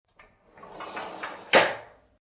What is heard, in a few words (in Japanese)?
お風呂のドアの開閉音